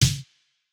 snare; Reference Beat BPM120; j city pop 1980

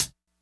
hihat; Pop; funkvol1

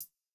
hihat; Reference Beat BPM120; j city pop 1980

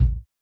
kick, lofi hiphop; Legendary lofi hiphop

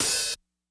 open; Pop; funk; funkvol1

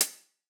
hihat; Reference Beat BPM-130; Pophits

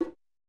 bongo, lofi hiphop; Legendary lofi hiphop

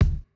Jrock kick

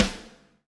snare, snare house

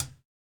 hihat, house

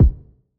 Hiphop kick; Reverb+EQ+Comp+Limit

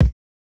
kick, house

